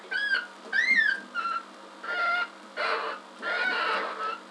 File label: Rhombomys opimus aggressive